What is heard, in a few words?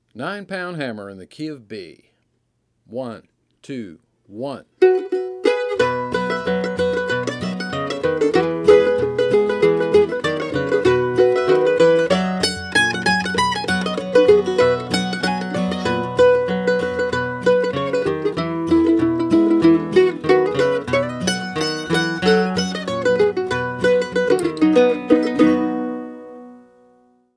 Key Of B; Nine Pound Hammer